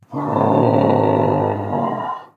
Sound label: idle, male zombie; nmrih; zerosiki; slender